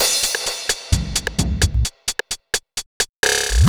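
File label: Percussion, Drum Loops 130bpm; Zero-G; Soundclash Nu Dance Electro; Acidized Wavs; Straight Laced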